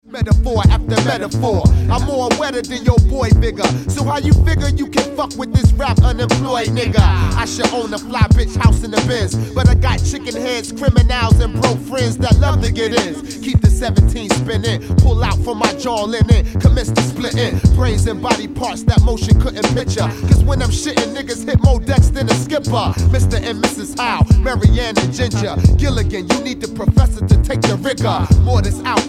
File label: rap before